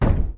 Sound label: Jester Stomp; Lethal Company